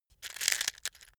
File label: matches crunch